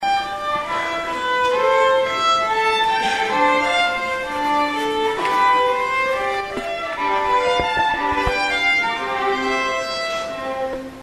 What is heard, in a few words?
violin